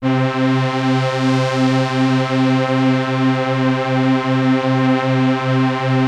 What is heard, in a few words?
Access Virus B; Synthworx